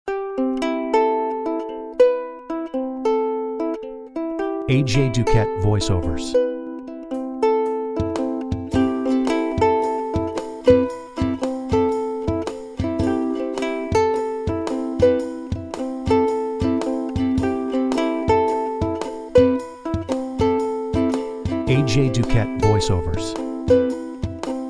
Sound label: Pop